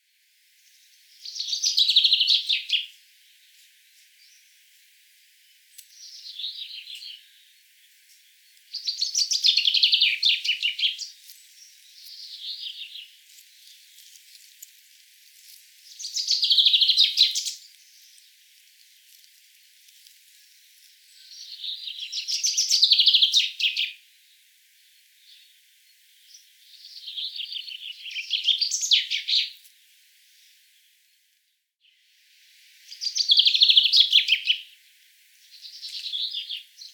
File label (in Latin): Fringilla coelebs; SETE